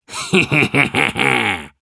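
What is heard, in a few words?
laugh evil, Russian; Demoman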